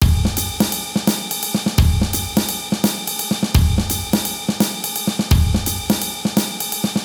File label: 170 bpm